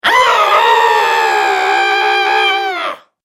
Scream Witch; Screaming Sound Effects

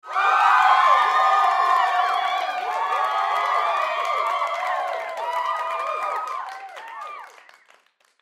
Human Crowd Studio; Crowd Reactions Celebration Cheer Clap

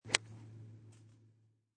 Golf Iron Fairway Shot, an iron fairway shot.; Sports; High quality stereo recording

